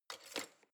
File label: knife wood drawer place